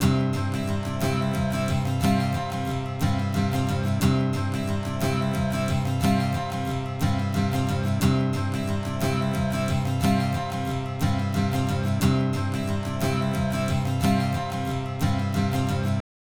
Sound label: guitar